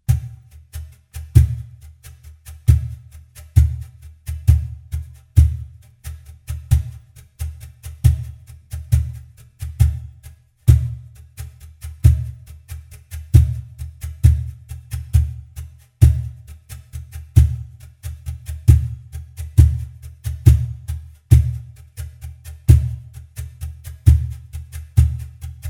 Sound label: cajon